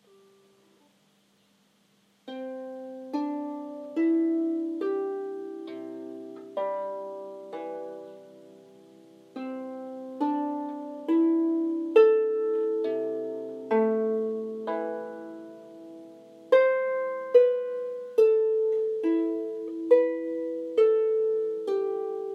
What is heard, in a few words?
Ripples of water, harp